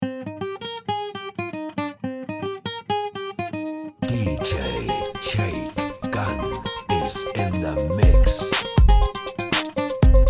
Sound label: disco